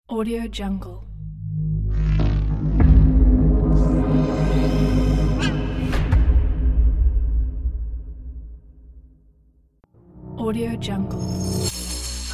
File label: texture logo pack